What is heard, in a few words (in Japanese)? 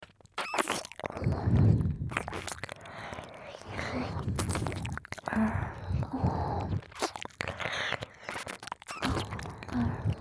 脳バグ まったり MIX 両耳, ASMR